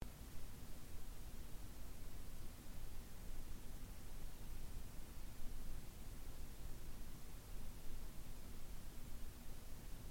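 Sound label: sounds of room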